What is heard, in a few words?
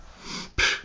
cyclic breath